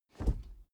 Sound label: suv pull back gear straight soft damped; verkehr transport; autos